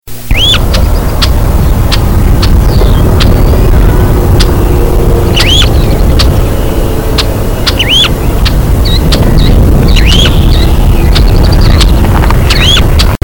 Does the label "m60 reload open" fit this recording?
No